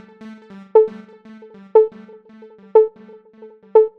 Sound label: Synth, 120, A